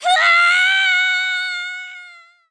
damage twinkle; ness scream

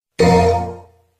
menu sound; devil may cry

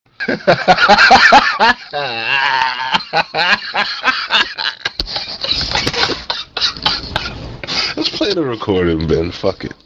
laughs

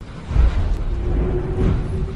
tripod machine sound

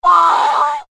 booooh; ttyd

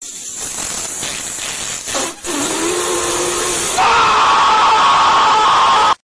fffffffuuuuuuuuuuuu vocals

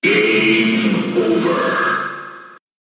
game over; melee

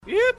iiiieeee

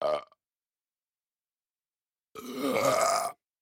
male burps

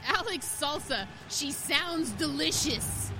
salsa

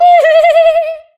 unnerving sound

